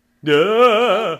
Goofy Ghost

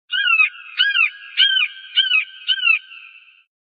Seagull Message

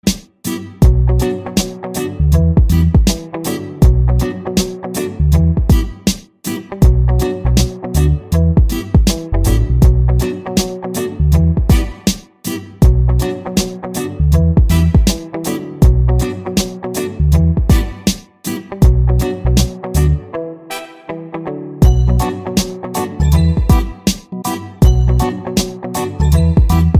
reggae hip

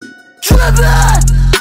Drippin

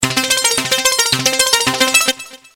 Sms Melody

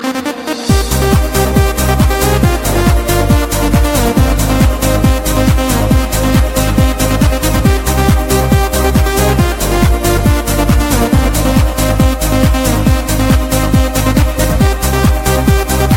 Trance; Trance Minus

